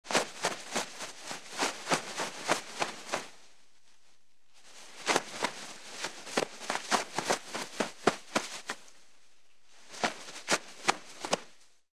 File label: Unwinding toilet paper